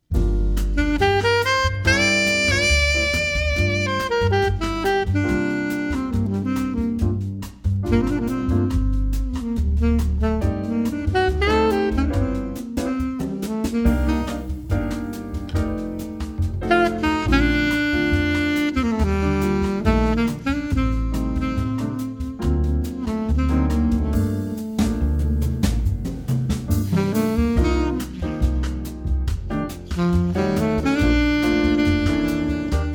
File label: Quartet